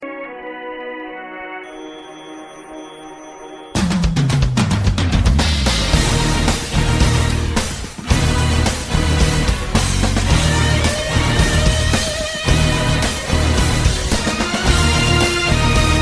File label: Rock That Beat Hard